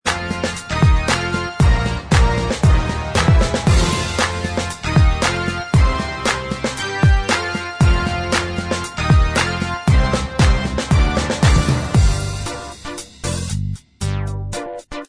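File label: instrumental; High School Musical 2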